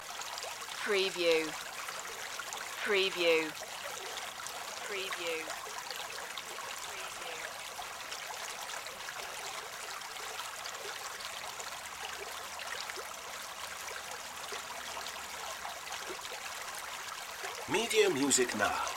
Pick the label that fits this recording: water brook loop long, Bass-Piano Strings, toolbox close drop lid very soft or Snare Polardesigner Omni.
water brook loop long